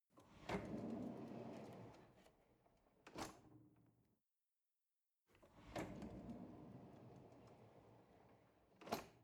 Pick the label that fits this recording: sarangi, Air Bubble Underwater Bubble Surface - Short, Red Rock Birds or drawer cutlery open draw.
drawer cutlery open draw